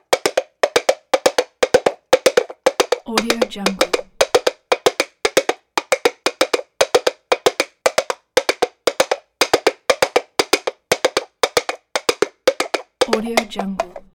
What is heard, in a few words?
coconut hooves